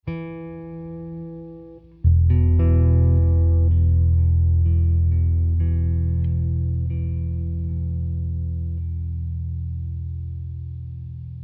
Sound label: Airy Strings and Bass Bass Only; The Last of Us; Horse Ride Oneshot